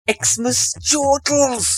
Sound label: chortles